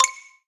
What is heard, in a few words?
count Up End; blackjackclassic